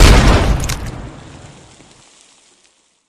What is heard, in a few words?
pop; flood; carrier; predatorcz; halo